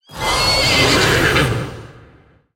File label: Lightsteed Death